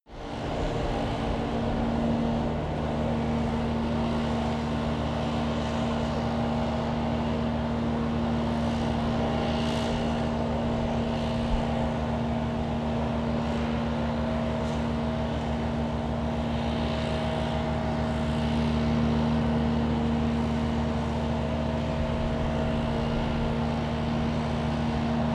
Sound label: suburb leaf blower